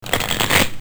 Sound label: cartoon; funny